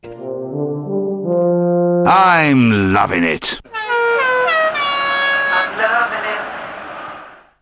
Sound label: brass; McDonalds